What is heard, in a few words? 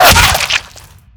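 physical bullet impact flesh, violence; Benny Placeholders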